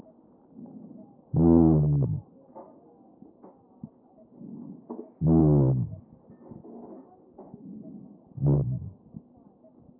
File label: chest